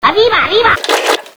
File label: Can Pickup